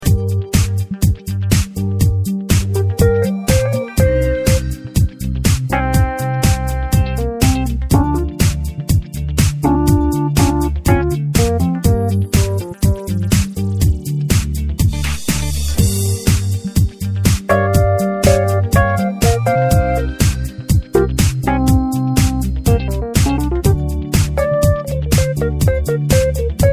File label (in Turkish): bk-7, roland bk-7m ritim modul